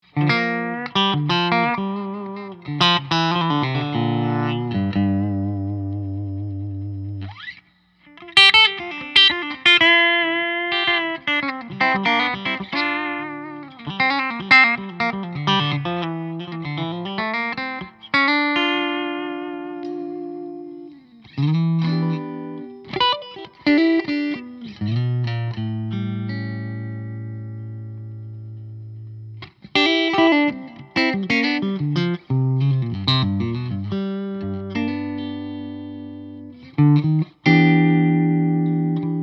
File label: Fender Relic Nocaster clean, electric guitars, solid body; fender custom shop; cunetto era